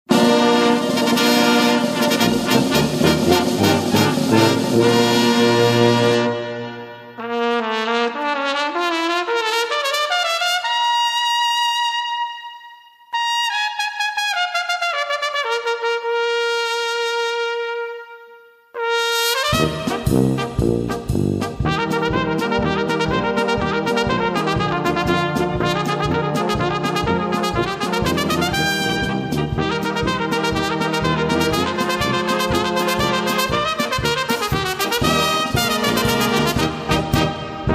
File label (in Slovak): solista trumpetista